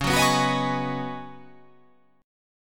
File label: C sharp 9th Suspended 4th C sharp down, chord